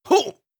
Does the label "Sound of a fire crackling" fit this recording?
No